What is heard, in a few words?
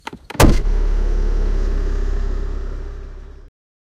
vehicle accident sound, crash